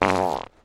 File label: fart shortish